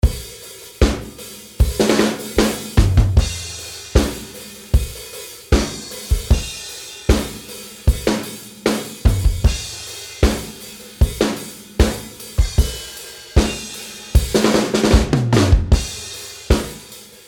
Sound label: drum mix reamped